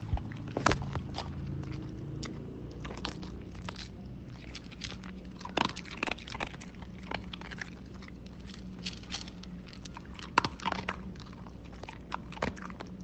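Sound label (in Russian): собака ест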